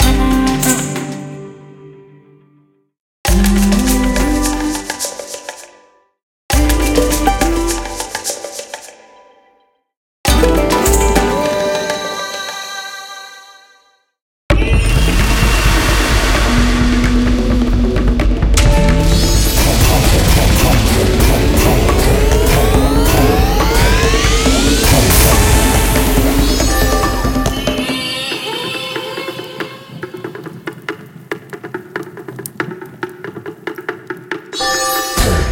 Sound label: Win Sounds Big Win; Tiki Mania